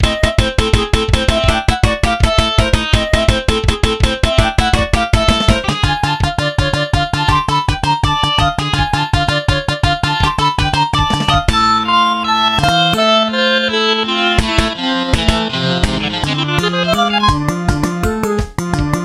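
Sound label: Boss Battle, MIDI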